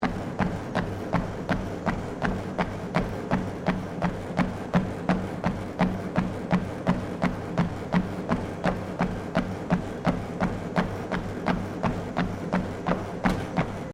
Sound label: GYM TREADMILL RUNNING 12KPH LOOP; Various gym machines; Equipment; Sports